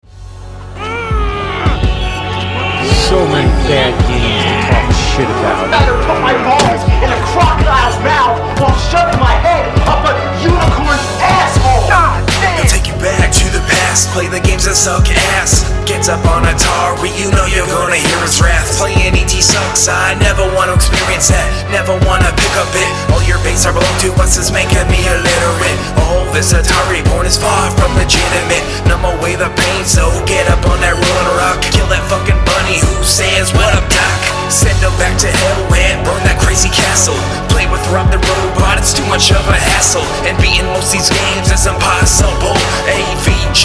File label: Rap; Angry Video Game Nerd